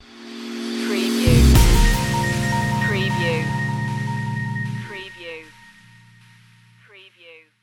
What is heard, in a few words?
chilled funk stinger